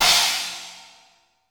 DRY CY CHINA, drum; Crypton; DrumBase5000

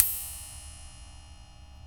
Bell, World Percussion; Sony CS

